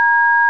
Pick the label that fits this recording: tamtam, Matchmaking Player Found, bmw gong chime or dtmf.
dtmf